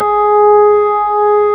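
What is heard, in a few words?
ORG B3 G#4, organ; Best Service Gigapack 2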